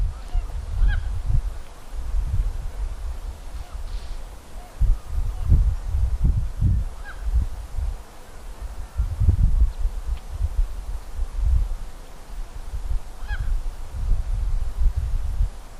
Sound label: meltwater south ice; tanzania